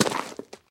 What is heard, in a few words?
flint step; Golden Crayon Sounds